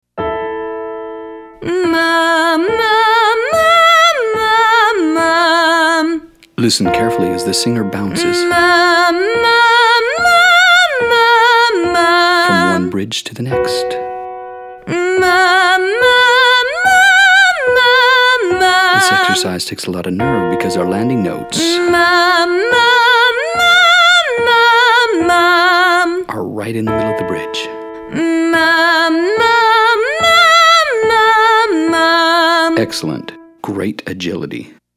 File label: Muh Muh female